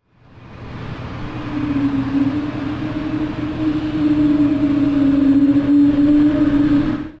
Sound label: Whale song